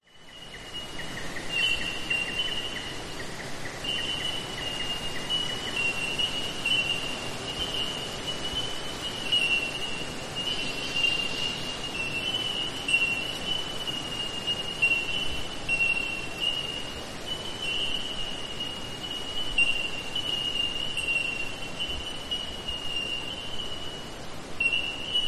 nature forest ambience eastern australia summer bell minors insects; zapsplat